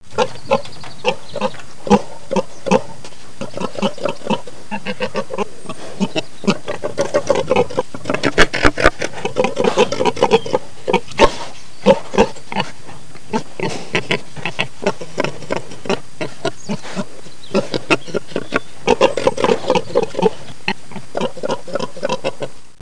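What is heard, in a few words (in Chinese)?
zhu jiaosheng, pig grunting; jiachu jiaosheng; jiaqing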